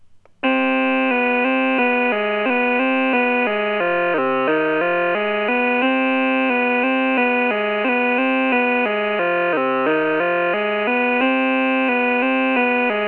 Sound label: DRONE